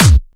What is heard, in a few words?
kick